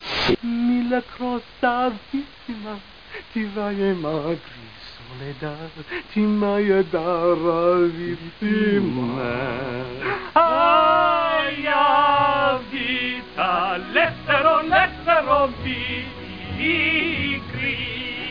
STATIC; Babylon 5

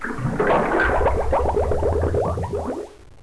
tank sinking far; Bolo

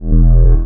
gravity link pass effect; Earth and Beyond; EA Games

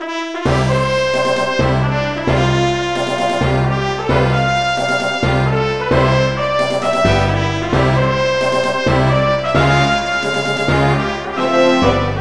victory; MIDI Music File; General MIDI

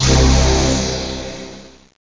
fat sq, synths; Zoom - Release 2 (1996); Active Software

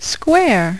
Square; My Preschool Program Demo

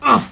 ouch; rott

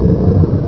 GATE; The Ultimate Guide to Mortal Kombat

